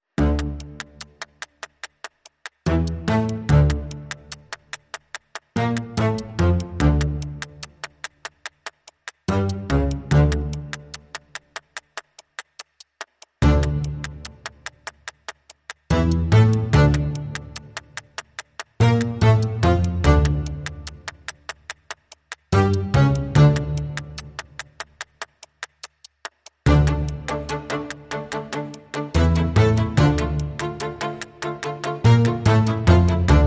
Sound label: Strings and Clock Ticks Only